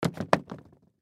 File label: Tennis Shoes Drop, Foley Tennis Shoes Drop Footwear; Sports; Blastwave